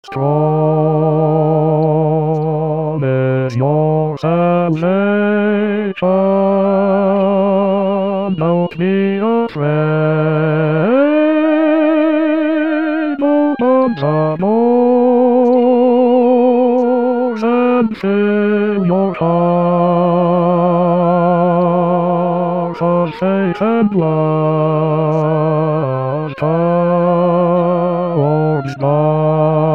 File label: Open the doors bassi, voci guida; corali in vaticano 2024